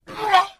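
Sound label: Elephant Single Short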